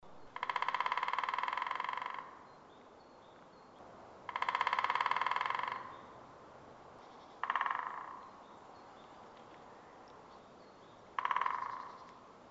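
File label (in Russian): звук стука дятла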